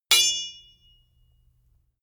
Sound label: sword hit sweetener